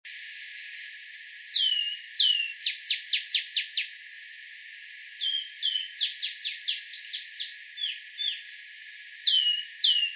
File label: green backed sparrow